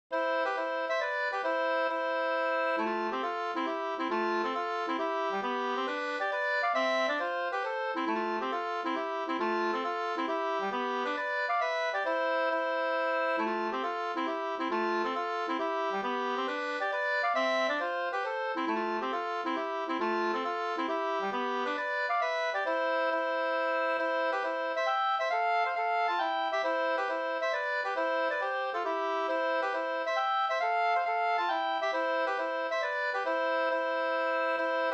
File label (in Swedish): mazurka mazurkalin; e Ernst Abrahamsson Bh BgdINp56-3 2st